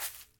Colision Feuille little

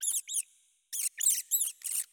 Rat squeak; Super Mombo Quest

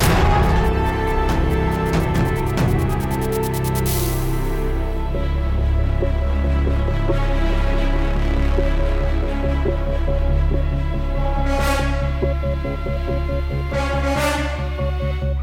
Battle Intro; Infinity Blade 3

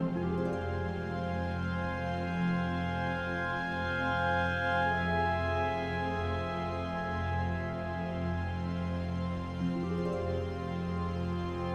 Music Dialogue Suspense 50bpm Loop

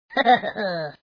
Laugh; Sarge

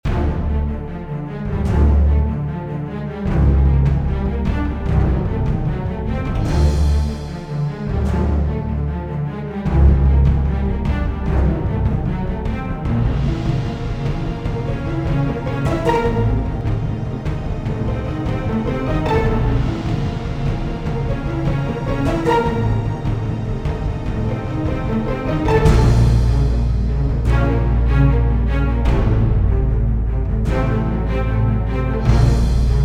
Fight Till Dying; dark water slime invader; switch windows

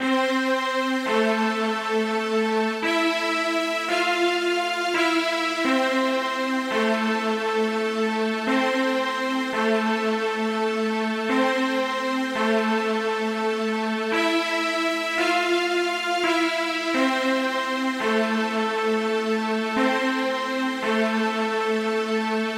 Loop Nofade Nobase; King Lucas

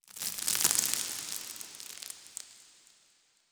environment rock debris; Aragami

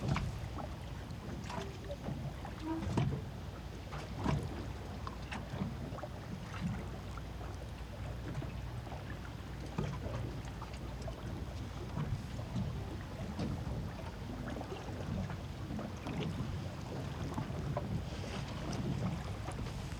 amb boat; Grapple Dog; switch windows xbox one xbox series xs gamerip 2022

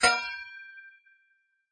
Ting; Ki11er Clutter

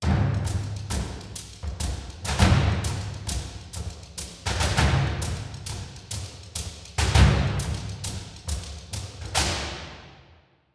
Drums; Necropolis

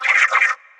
Cat meow

